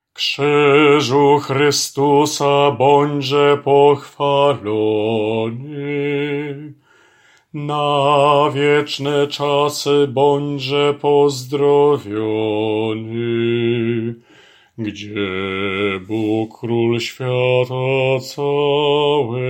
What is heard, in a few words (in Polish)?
Krzyzu Chrystusa BAS